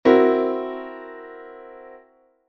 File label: chord